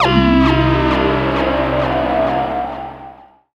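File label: synth, Production Effects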